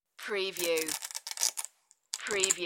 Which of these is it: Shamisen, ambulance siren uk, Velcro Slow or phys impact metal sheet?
Velcro Slow